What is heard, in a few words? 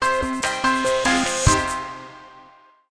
Win